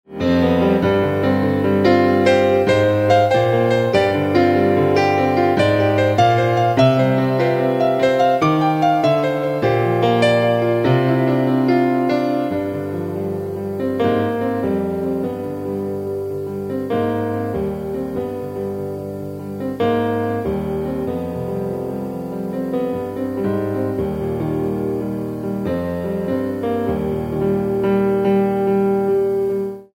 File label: piano